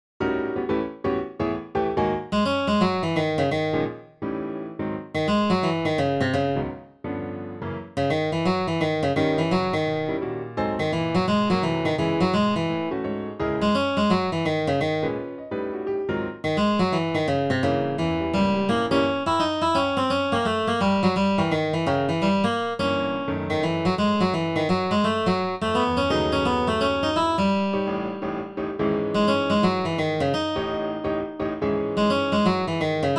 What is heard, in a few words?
accomp melody